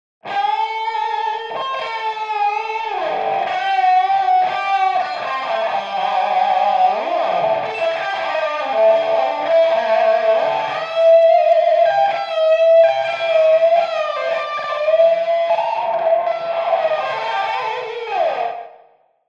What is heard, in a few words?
Tube Driven Tape Echo Wet; Echo Chamber; Echo Chamber is a stereo reverb and delay plug-in; Syntheway